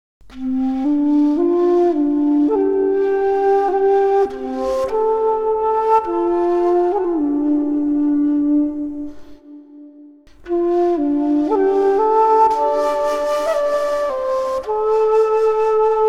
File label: Scene Music; Age of Wushu; Windows